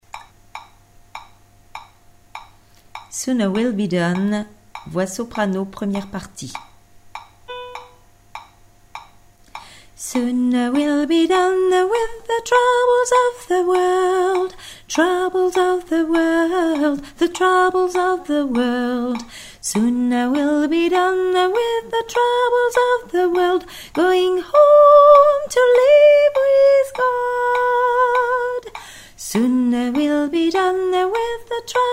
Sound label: soprano